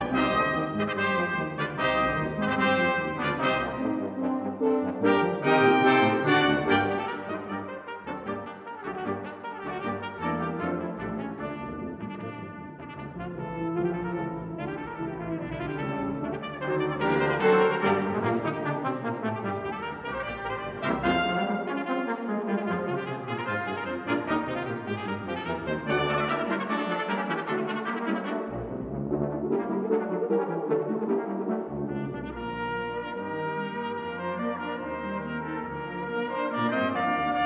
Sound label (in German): Konzertetuede in g-moll fuer Trompete und Klavier